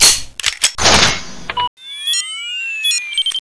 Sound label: BFG reload, weapons